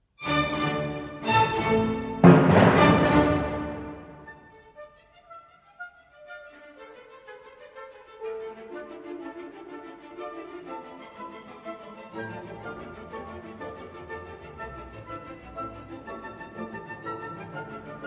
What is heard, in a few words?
Symphony in D Minor, Choral